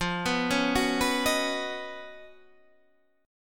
Db 11th F, guitar

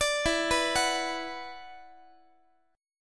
E 7th Suspended 2nd, down, Guitar; E7sus2; arpeggiated

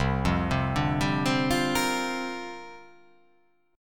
C 9th Suspended 4th C down

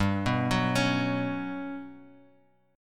G Major Sharp 11th G, Bass Standard; arpeggiated; JGuitar

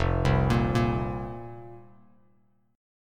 F Suspended 4th F, Bass Standard; Fsus4 arpeggiated; JGuitar

